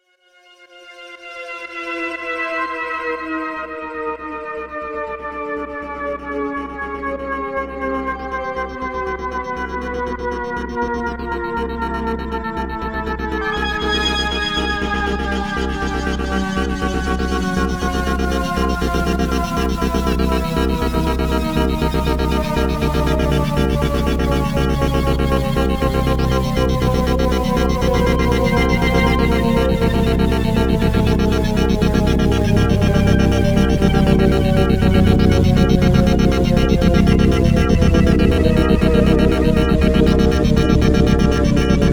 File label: Hydrasynth Massive Glide